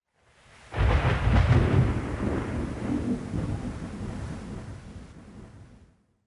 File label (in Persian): Raad